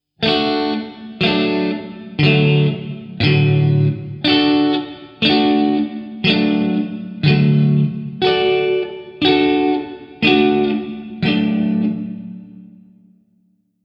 Montagem de Acordes Triade Maior

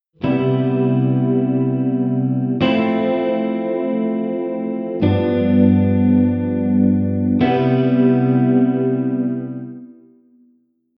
Cadencia C7M F7M G7 C7M Acordes

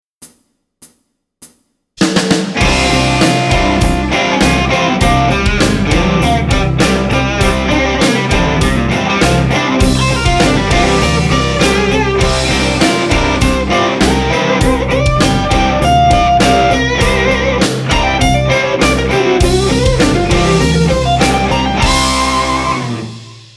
Penta Gm7, guitarra; Dicas de Guitarra

